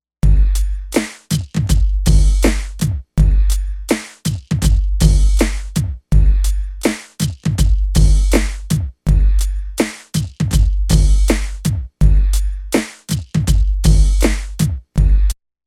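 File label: Drum Loop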